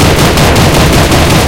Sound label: mgc30l loop, guns